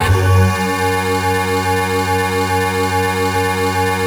synth clav

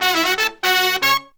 Horns, 120BPM; 90 s Sample CDs; Zero-G; Phantom Horns

